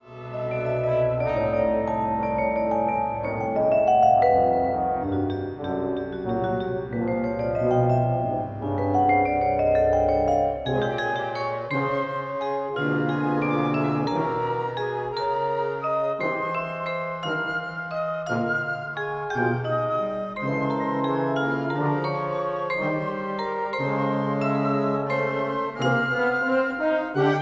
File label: Orchestra